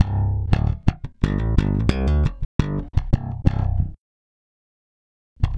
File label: Electric Bass